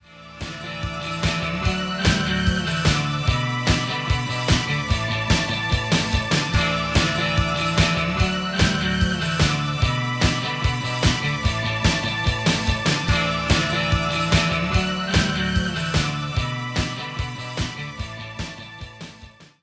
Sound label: MUSIC